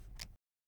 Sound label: item rollover